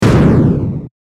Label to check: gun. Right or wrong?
Right